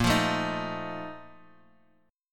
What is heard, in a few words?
Bb Major 7th Suspended 4th, Guitar, Open D; 8,8,7,9,x,x